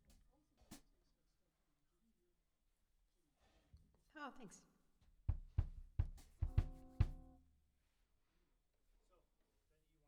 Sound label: Rough Mix